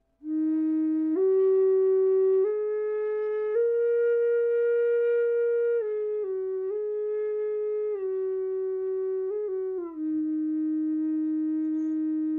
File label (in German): Indianerfloete Franzoesischer Nussbaum E 432 Hz ohne Echo; ahorn; drone flute